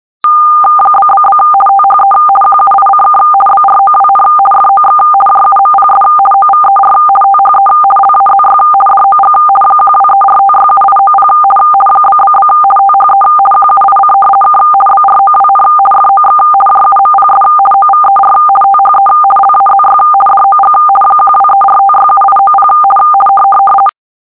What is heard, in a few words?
rtty 425 50